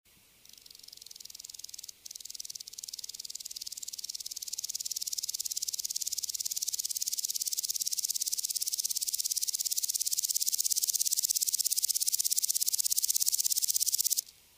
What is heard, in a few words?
Omocestus rufipes; BIOAC ORTH